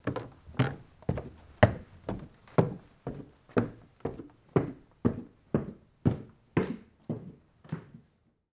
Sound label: Footsteps Wood Stairs; HauntedBloodlines